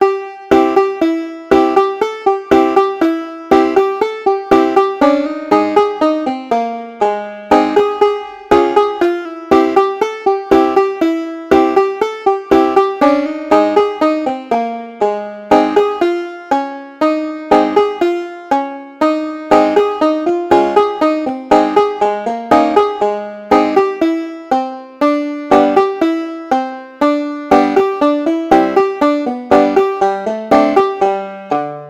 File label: banjo; calwhammer